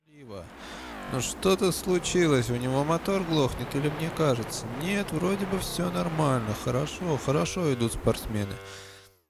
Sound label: motor; Мотор глохнет